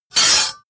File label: sword cut hard slash